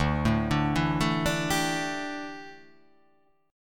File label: D Suspended 2nd Suspended 4th D down, chord; arpeggiated